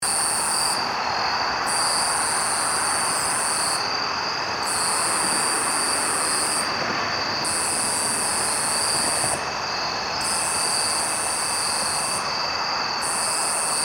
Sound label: nebraska conehead; thomas wilson